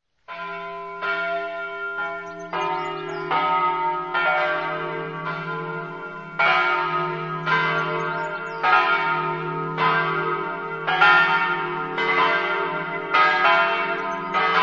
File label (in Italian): campane; poffabro